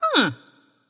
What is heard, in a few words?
nv confused; smite; voicepack; morgan le fay